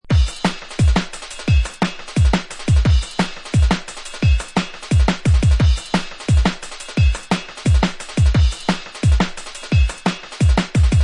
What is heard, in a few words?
Dreadz Originall Hard Steppaz, beats; ray keith dread jungle breaks vol 1 60 beats for dubplate clashes battle junkies